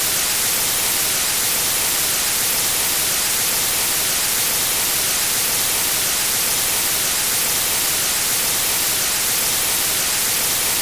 static

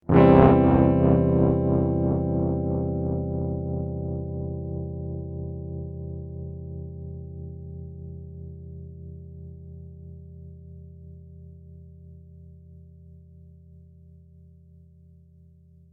Notes Cadd2 Down; Hylics

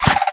Swamp Jumpers Close Attack; we are the dwarves gamerip 2016

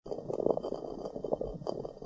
Roll Stone Stone

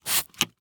spray bottle single spray

